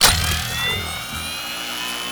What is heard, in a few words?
Long Gun Charge; Warframe; Weapons; Thano Tech Arch Long Gun